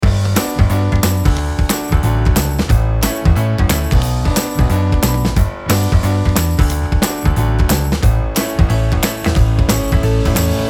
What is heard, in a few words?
Summer Upbeat Loop